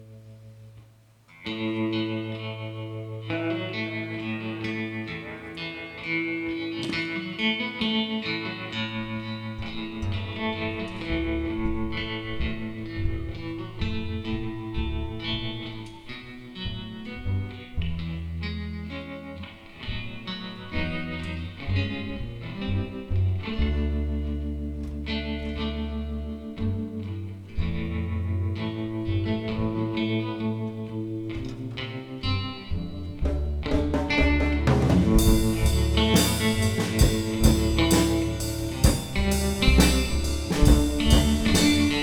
Jam

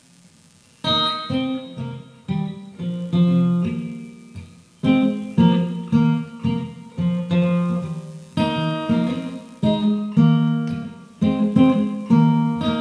Jam

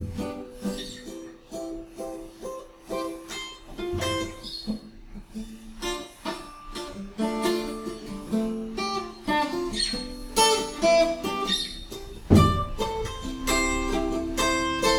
Some Chords Silk And Steel; r1 studio sessions

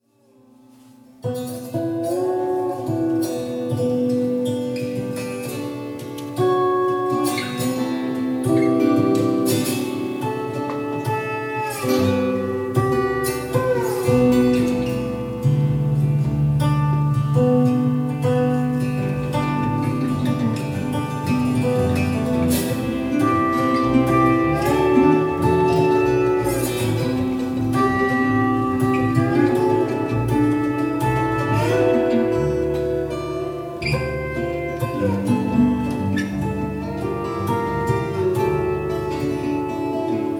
Lap Steel Two

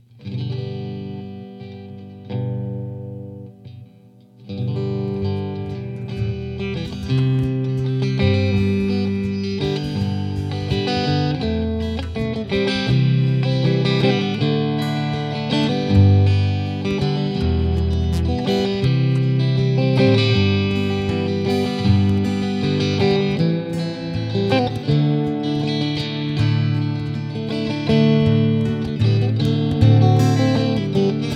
Jam; r1 studio sessions

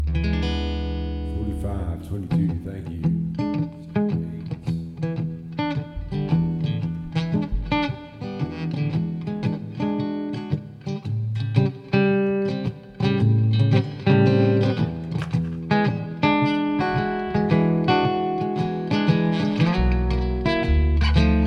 Short Blues Jam; r1 studio sessions

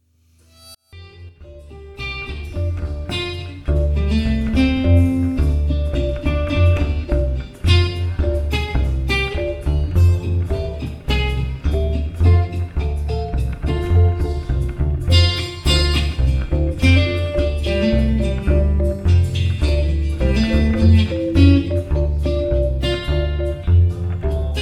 Jam; r1 studio sessions

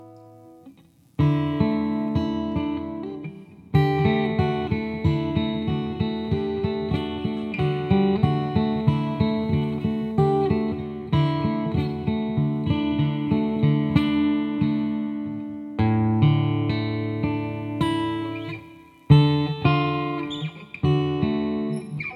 Jam

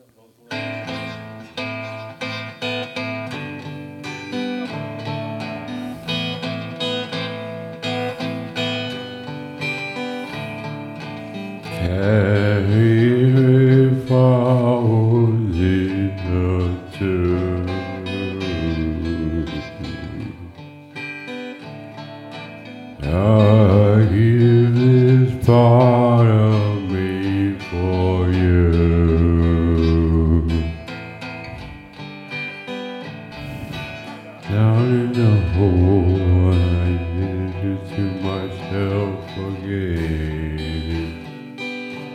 Jam; r1 studio sessions

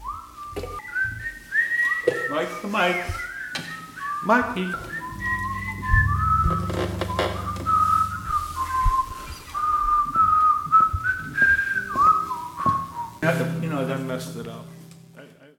Whistle

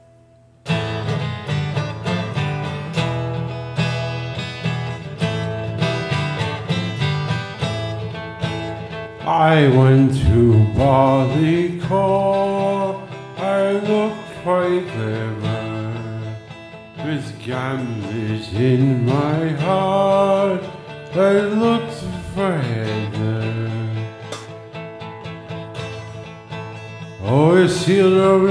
Jam; r1 studio sessions

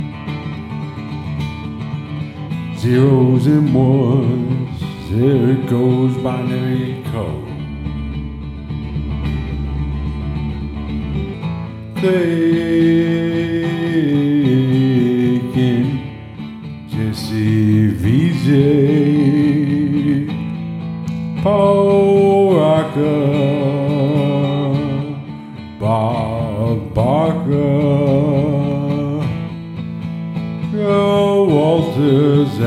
Jam

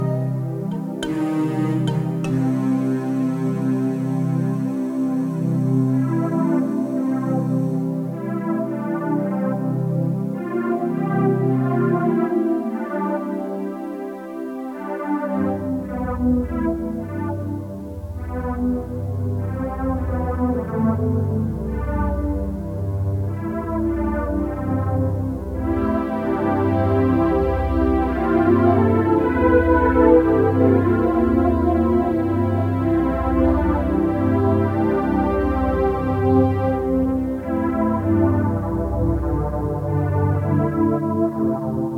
keys; r1 studio sessions

